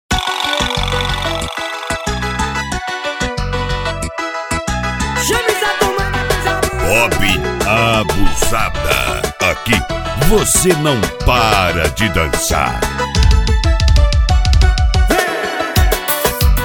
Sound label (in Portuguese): piseiro; bob abusada vol 02